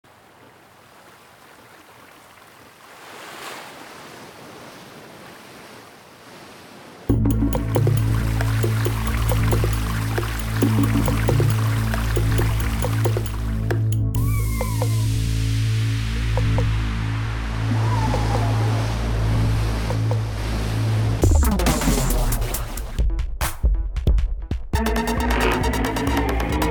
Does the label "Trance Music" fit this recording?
Yes